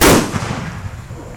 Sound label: Fireworks Triangle